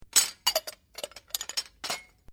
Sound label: China cups stacked; china cup breaking; Set Down Sound Effects; Objects